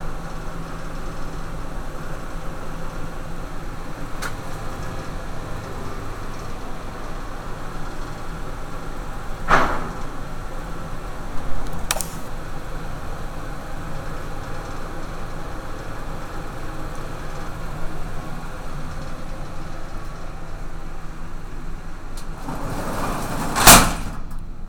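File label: Lift transiting, interior; ENV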